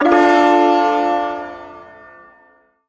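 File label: Shawzin Major Chord; Maj Chord B; Warframe; Duviri Ersatz Shawzin; Chords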